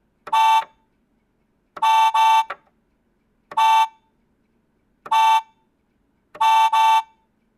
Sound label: Toy Car Horn